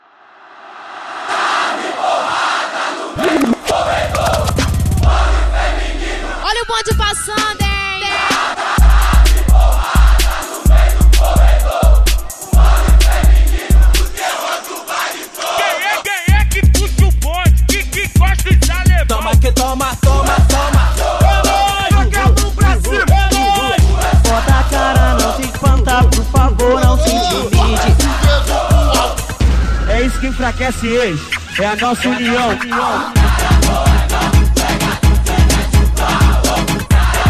bonde feminino; torcida organizada cearamor

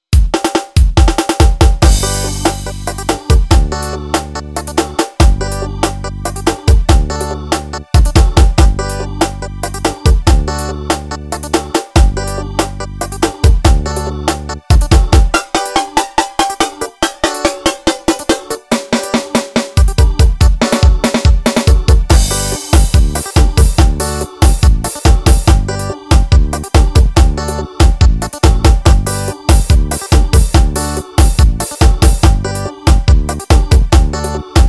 ritimo yamaha arrocha; pegada

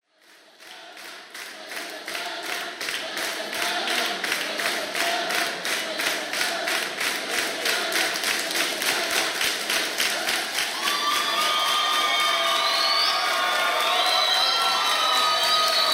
abertura ao vivo

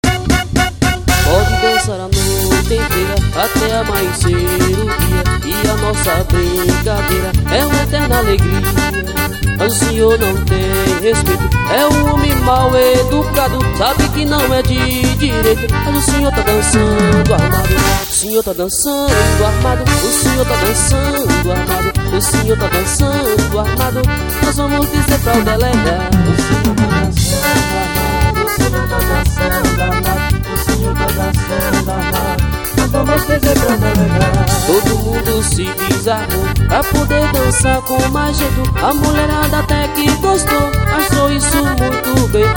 acordeon forró desarmado

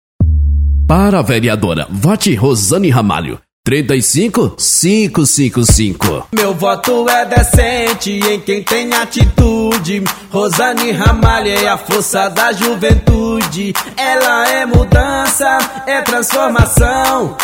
jingle

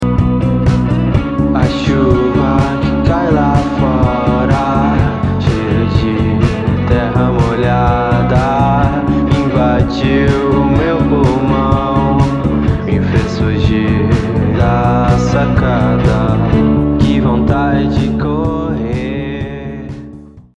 chuva indie rock autoral

